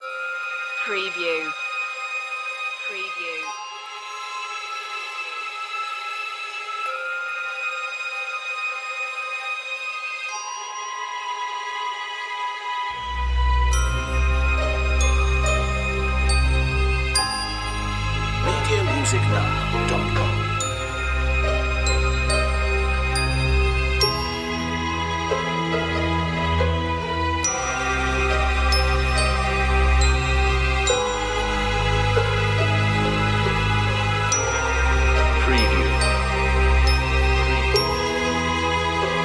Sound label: creepy orchestra